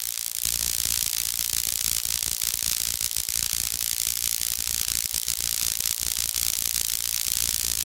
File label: Elect Arc Sizzle, arcs & sparks